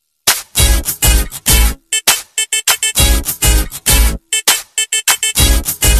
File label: Electric Guitar 3d